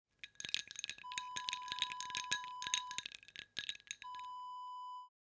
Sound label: ice cubes clink